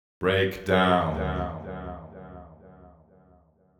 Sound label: deep house male, 125, A, vocals